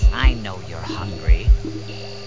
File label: hungry monster